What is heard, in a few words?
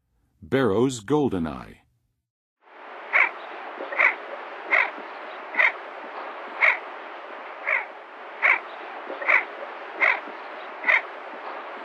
Barrows Goldeneye, Western USA Songbirds; North America